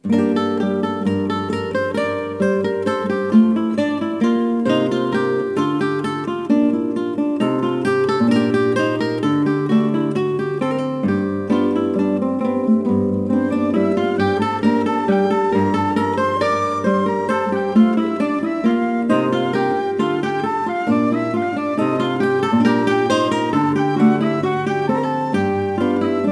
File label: dance normal; Sid Meiers Pirates; XBOX